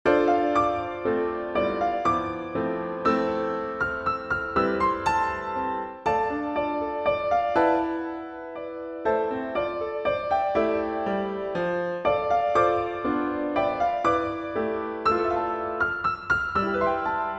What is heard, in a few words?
windmill jazz, A nice jazz piece, a short piano; Zelda